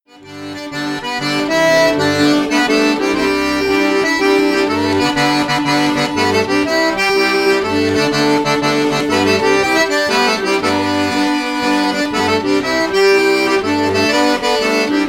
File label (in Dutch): Cajun 1 rijer 4 korig in C; Bon Tee; Lovebridge Waltz; Staalkaart